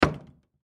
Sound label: Stove Drawer Open, Stove Drawer Open Antique; Historical; Appliance; Household; Blastwave